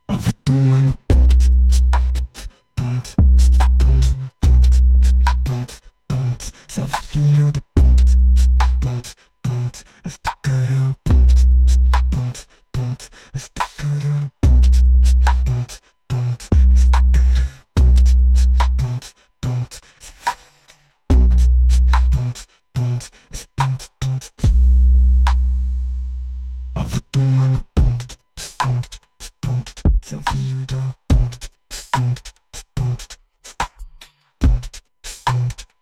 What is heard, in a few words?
Instrumental